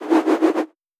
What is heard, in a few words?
Fire Elem Inferno